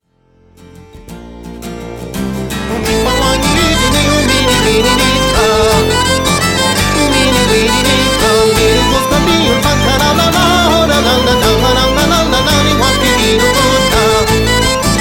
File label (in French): gavotte des montagnes